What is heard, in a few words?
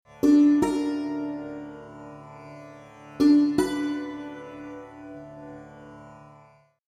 Swaras Dha Drone; ragas; ragajunglism